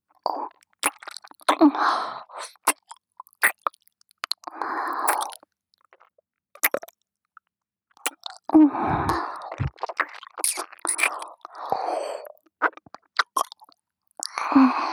両耳舐め (ループ); 無知生徒; SEあり

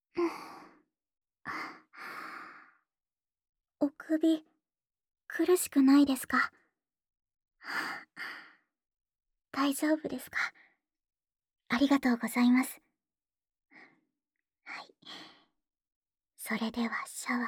ヘッドスパ ASMR ボイスオフ; ASMR版

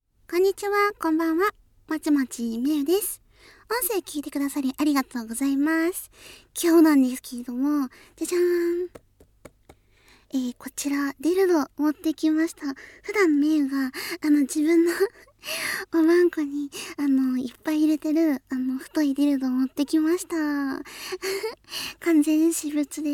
手コキ音

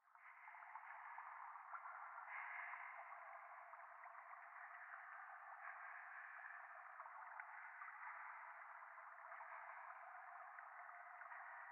ゴースト 左耳快感吐息 川のせせらぎ

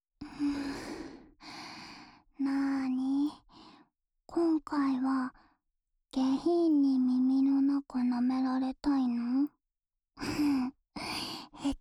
リラックス用深い耳舐めループ（右耳）, ASMR

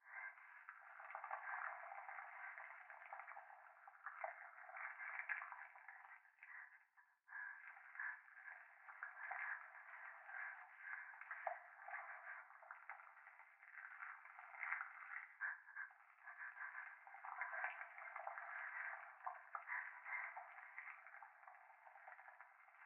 風呂 右耳快感吐息 触手

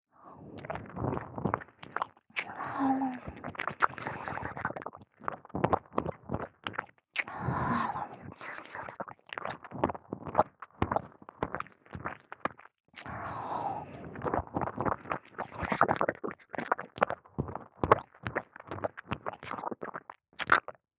耳舐め（奥まで）; レイナ; 姉

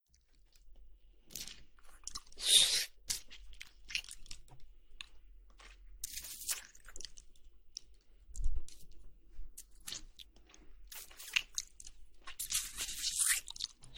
お姉さんの唾液たっぷりな癒しの耳舐めASMR

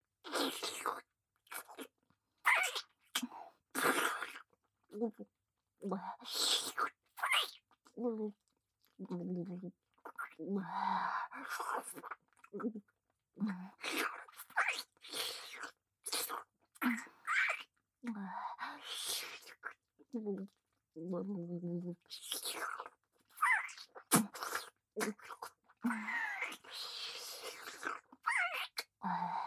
フェラ音

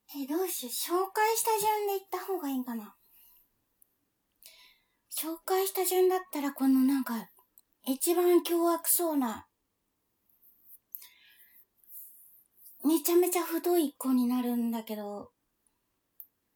オホッオホッ大量おもらし大洪水ブッシャアァァァァァァァ!!

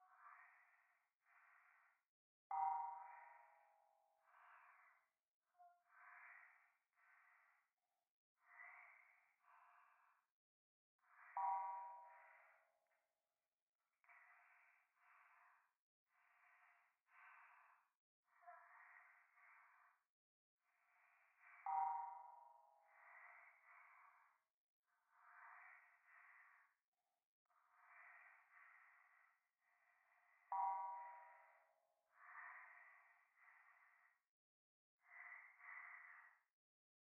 右耳 密着 吐息 寺; 夢の中

